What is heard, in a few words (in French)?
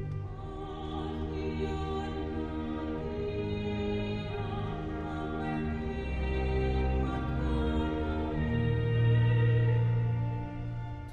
Irish Mass Gloria Solo alto